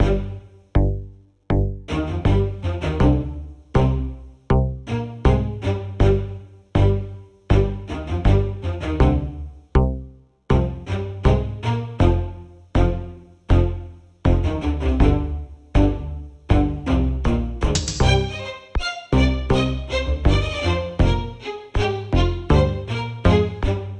City Layer loop mono; Yara City

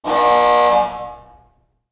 alarm heavy

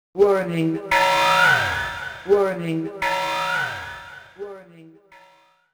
Warning; Cyber Troopers Virtual-On

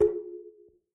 Button

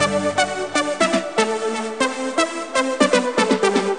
Music Disco In; J4G A Girl's World; NDS gamerip

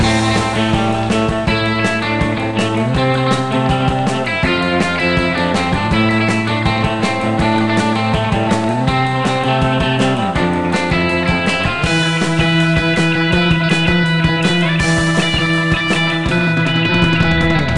Music Beach

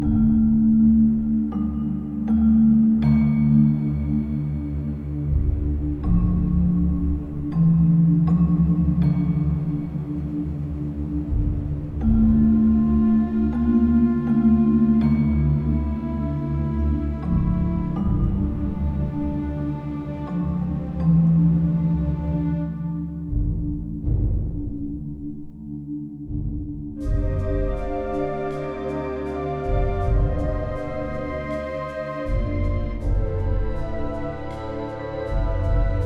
Cave Dungeons Exploration Loop, RPG Fantasy Music; Sofiya and the Ancient Clan; PS4, PS5, Switch; RPG Fantasy Music Pack 02

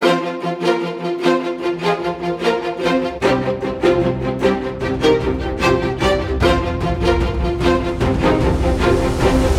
Bosses Preloop; King Lucas

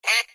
Coin 8bit; Puddle; puddle plus ios gamerip 2018

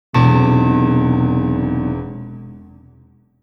Jingle Magic Trap; culdcept revolt original soundtrack dynamix 2017

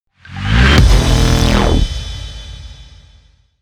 game over

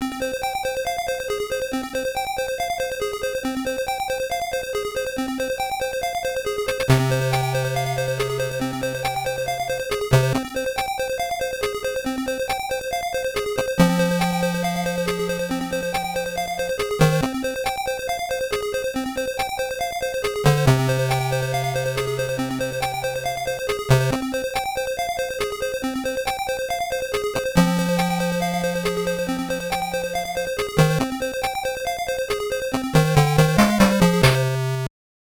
BGM; Alien Syndrome; Game Gear

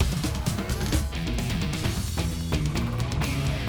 Jingle Score; Chop

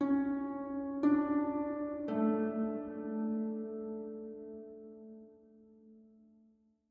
Harp Low; That Dragon, Cancer

